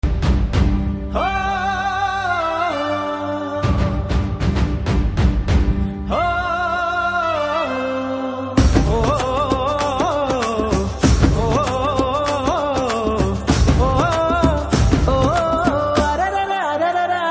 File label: BGM Humming Ringtone; Sreekaram